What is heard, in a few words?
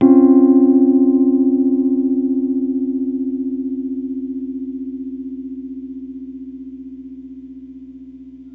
BBM DIM3RD, Chords Hits; E-MU Producer Series Vol. 8 – Platinum Phatt; EP Chords Hits